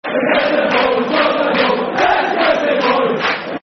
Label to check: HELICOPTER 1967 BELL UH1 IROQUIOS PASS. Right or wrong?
Wrong